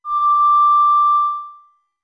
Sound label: Ocarina D long; D2; OOT